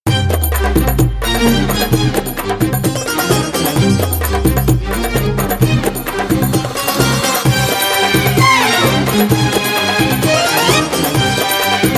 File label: spiccato legato scale down